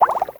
potion

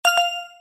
bomb

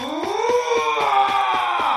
man Roar

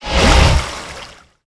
Water

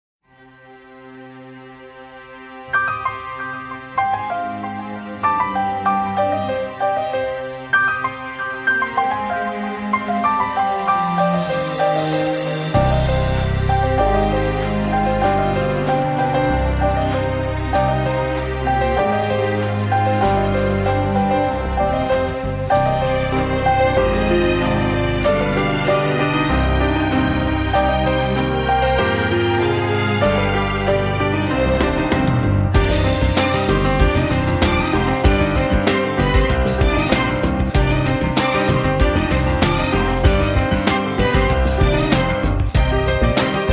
bgm

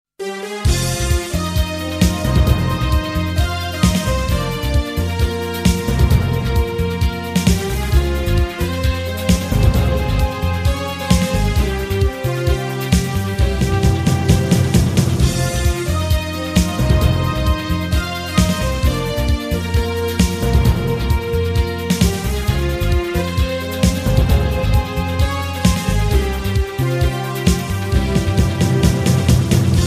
bgm

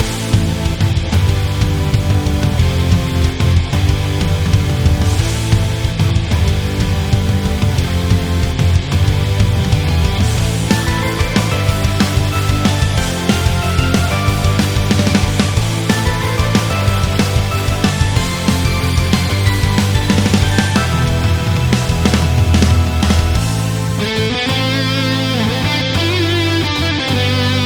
RPG Battle

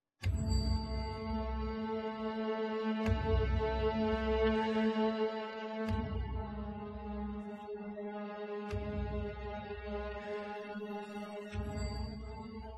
scary

